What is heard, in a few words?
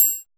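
closed triangle muted; Drums In Your FACE!; NEWJACK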